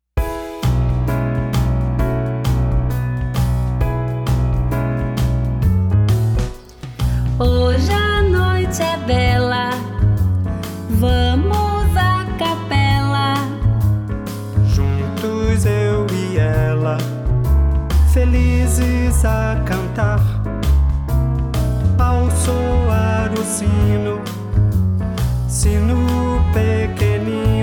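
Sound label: Jazzy Jingle Bells, tenor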